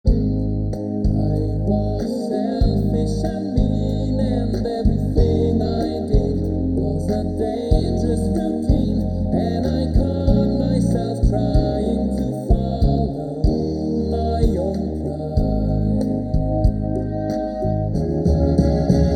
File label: Bandpass filtered in the time domain; Bandpass filtered using subband scaling